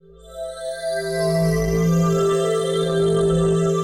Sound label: SYNTHPAD, HOUSE, 125, A; eJay; Sphere